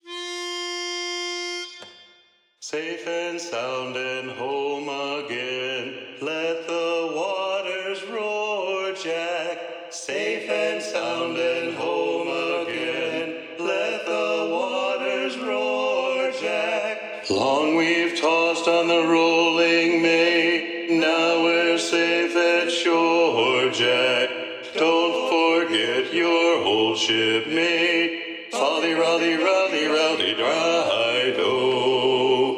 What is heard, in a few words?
Bass Predomina, sea shanties; Don t Forget Your Old Shipmate